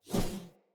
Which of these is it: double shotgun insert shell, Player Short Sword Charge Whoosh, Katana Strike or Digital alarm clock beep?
Player Short Sword Charge Whoosh